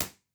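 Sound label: Live Room impulse response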